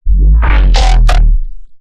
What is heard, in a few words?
bass neuro Eb; Anthesis; base pack